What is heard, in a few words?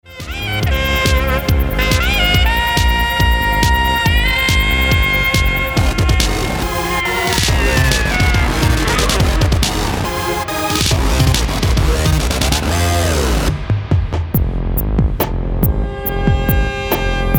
Saxes in Space